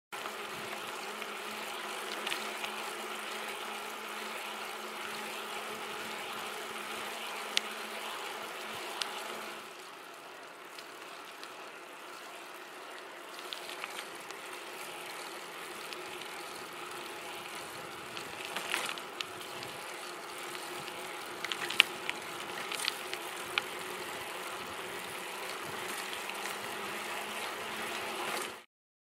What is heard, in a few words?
Cycling on road